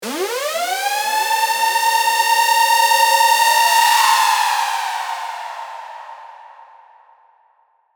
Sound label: riser siren sweep